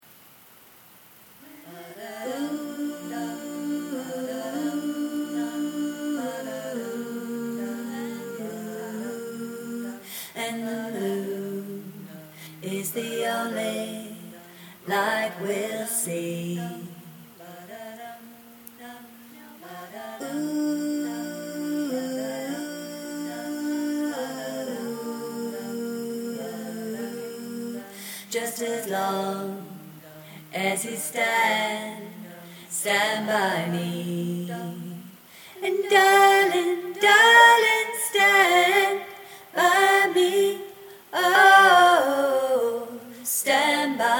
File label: alto